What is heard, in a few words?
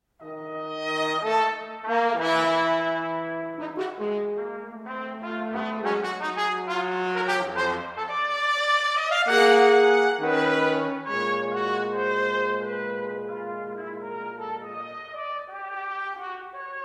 Brass Trio Fast